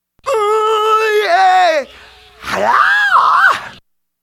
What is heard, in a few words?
yell